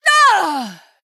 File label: Female Night Elf Death